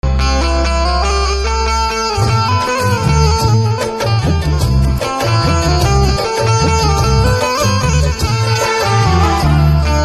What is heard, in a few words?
Banjo Instrumental Ringtone